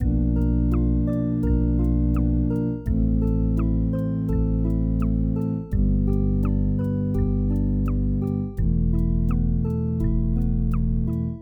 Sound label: lofi chill 84bpm loop